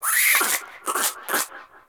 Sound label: Landscape Close Critter, Ambience; Warframe; Venus Open World; One Offs